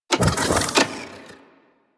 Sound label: Umbilical Machine Arms Disengage; Warframe; Liset; Hub; Machines